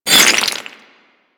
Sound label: Melee Impact Cement; Warframe; Weapons